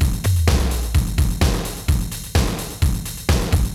drum loop

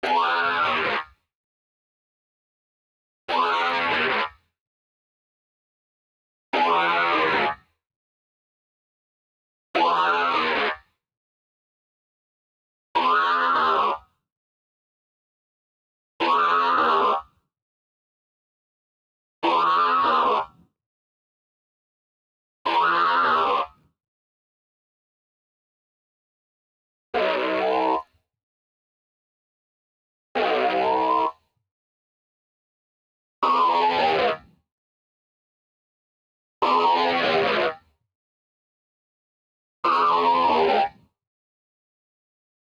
Prophet Vox

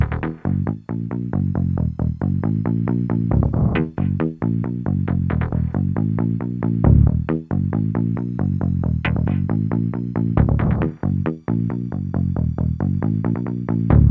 Bassline, 136

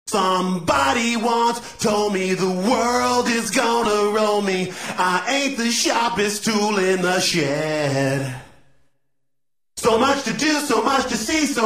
clean acapella

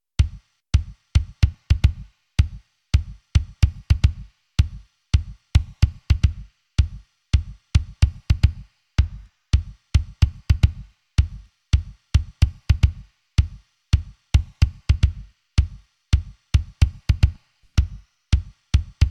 drums

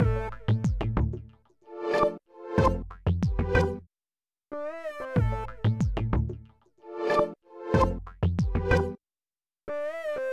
Keys FX

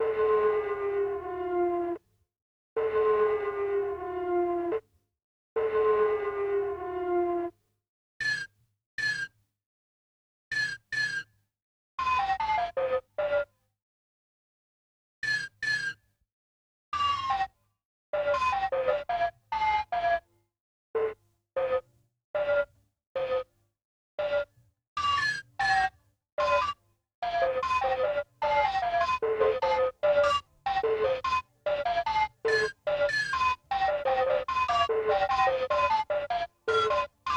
Saws

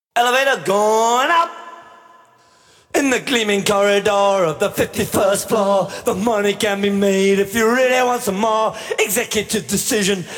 vocals

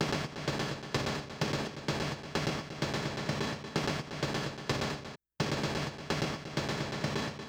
mid perc

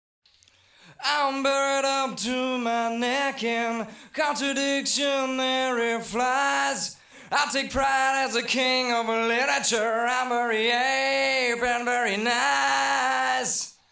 vocals

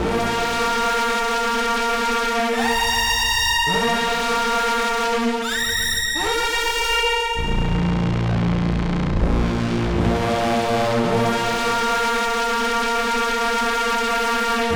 Big String

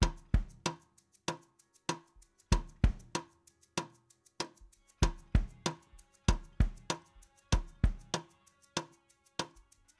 Kit Bridge

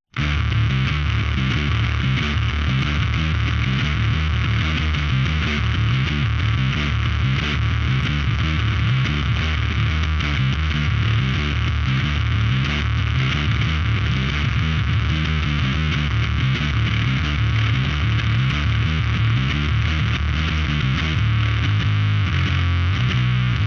rhythm

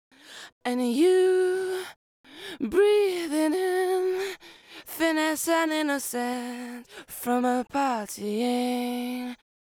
Lead Vocal Verse I; Multitrack

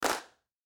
Clapz; Remix Sample Pack

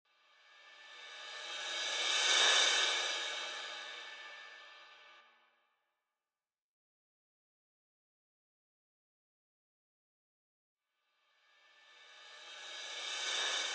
Drums

